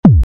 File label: kick